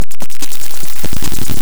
FX BEAT

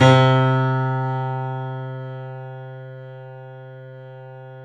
The Piano, C; InVision Interactive; BOES PLAY HARD

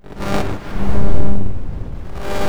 buzzy, Disjointed Textures; USB Soundscan vol.33; AKAI

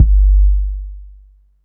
BOOM, Techno 138 BPM; Best Service ProSamples vol.54; AKAI

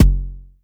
Noisy bd, Jomox Airbase99; Drum Machines

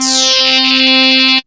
SWEEP C3; Best Service Alpha Dance vol.2

